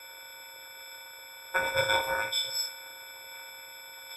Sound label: Normal Audio 8channel